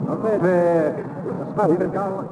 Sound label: walla; DAoC